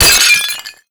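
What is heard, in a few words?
destruction pot break; Explosions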